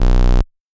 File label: bass